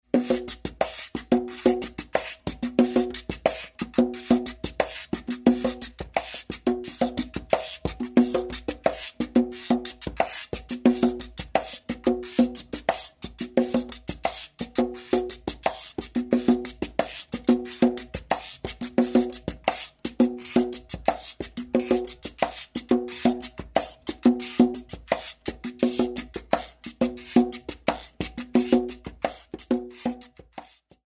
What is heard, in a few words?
Percussion Dry